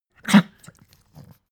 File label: pug gag smack medium; natur-tiere; hunde